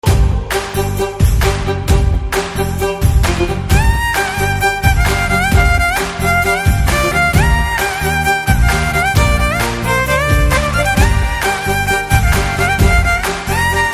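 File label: background music Ringtone; Dhairyam; Rowdy Boys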